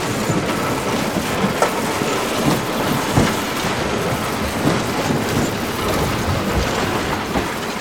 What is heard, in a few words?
Auto Tires Scrape Wall Loop; Automobiles